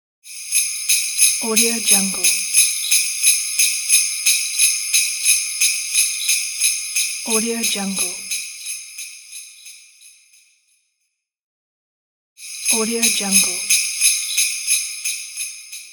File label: christmas reindeer bells; serranostockmusic